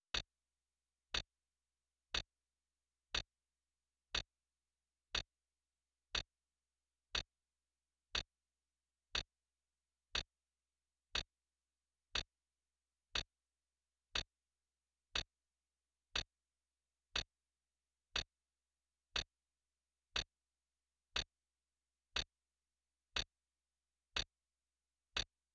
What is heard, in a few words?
Clock; Clock Tower 20th Anniversary Sound Collection